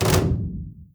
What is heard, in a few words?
battle thump; genshin